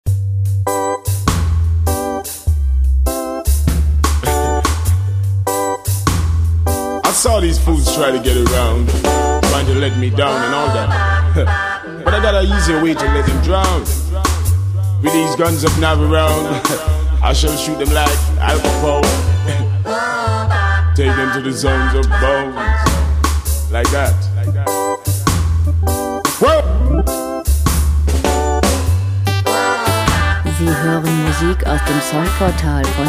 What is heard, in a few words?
reggae